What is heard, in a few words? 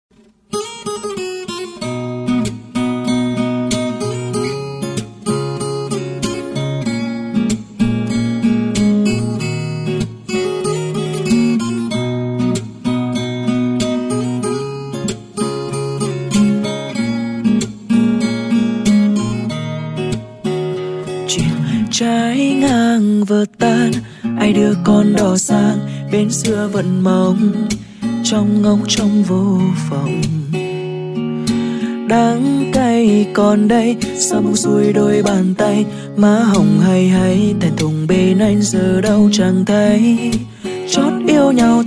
guitar